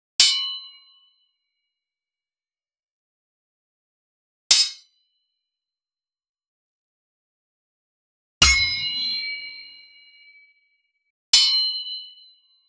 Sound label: sword hit